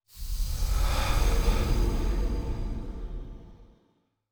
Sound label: death; aragami